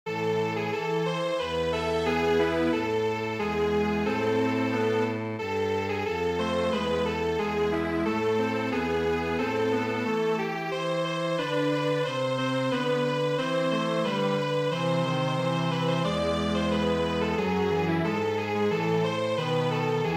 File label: Strings Quartet